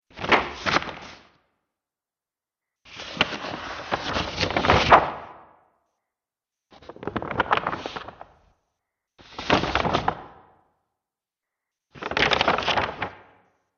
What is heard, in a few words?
Pirate Treasure Map Collection, Paper Sound Effects